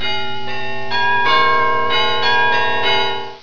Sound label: Alarm, Big Ben